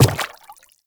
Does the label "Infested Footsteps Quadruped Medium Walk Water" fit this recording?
Yes